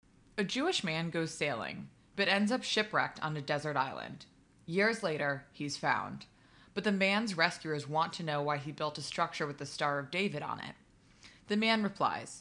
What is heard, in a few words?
Laugh Track